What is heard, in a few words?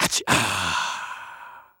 Male Voice, African Voices